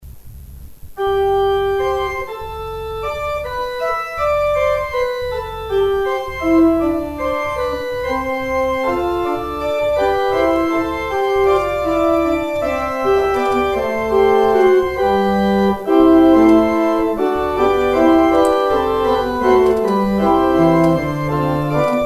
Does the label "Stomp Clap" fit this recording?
No